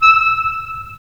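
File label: WND CL, wind clarinet; LA Composer vol. 3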